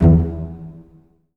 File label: Virtuoso Strings, TRM FX